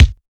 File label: Royality free kick drum sound tuned to the D# note.